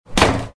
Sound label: Close Door